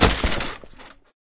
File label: moped wheelie land; video games; player; taunt; source engine; tf